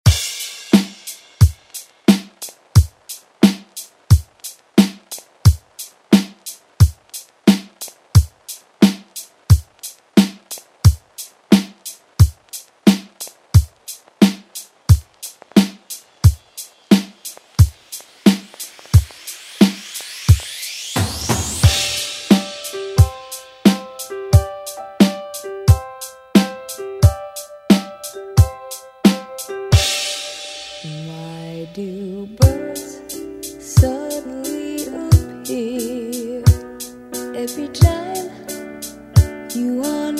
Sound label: 70s Re Drum; DJ Mhark